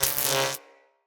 Aoe Shockwave Accent

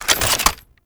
Exalted Sniper Reload; Warframe; Frumentarius

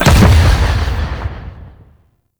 Rifle Projectile Explosion, Weapons; Warframe; Zariman Grn Wraith Rifle

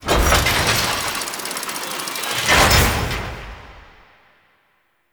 Tailor Shop Incubator Open; Nineteen Ninety Nine; Interior

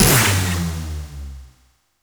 PvP Kill Message

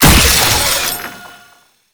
Void Heavy Scythe Crystal Embed; Warframe; Duviri; Incarnon Adapters; Melee

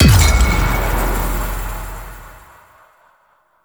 Hit React Quick; Warframe; Duviri; Dax

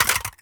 Pistol Reload Mag Drop; Warframe; Tenno; Lasrian

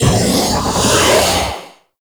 Gloom Charge; Warframe; Wraith

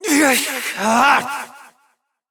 Evil Twin Doppelganger Frustration

